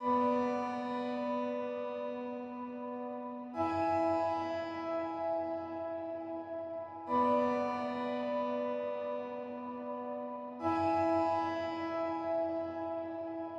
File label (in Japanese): 楽曲 子守唄 アレンジバージョン